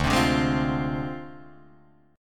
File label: D Minor 7th Flat 5th Guitar DADGAD